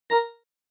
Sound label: click